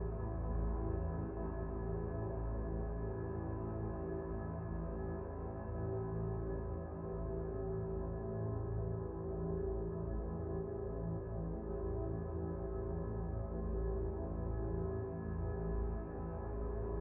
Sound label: Layer Drone; Rime